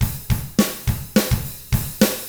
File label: POP ROCK, Drums; ILIO; Double Platinum Drums 1